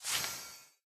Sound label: window Appear